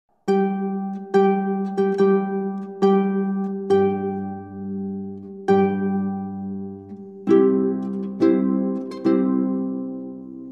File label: serenata bridal chorus